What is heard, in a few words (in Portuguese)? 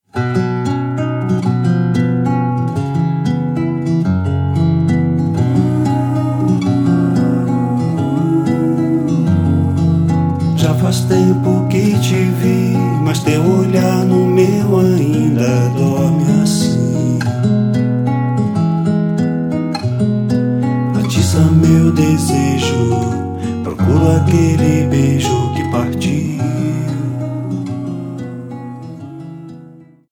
voz violão